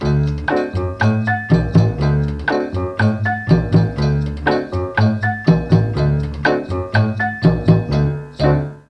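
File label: A2 CHA CHA FLUTE, Mellotron; Mike Pinder - Mellotron Archive CD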